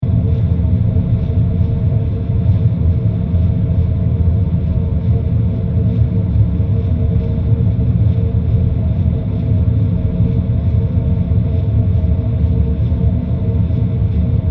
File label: Deep Space Ambience With Generator Hum 120bpm